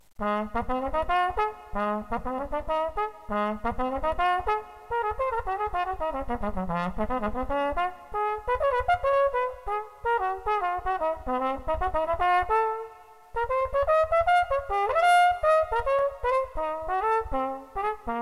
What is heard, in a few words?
jazz duetto; gheorghe branici; wednesday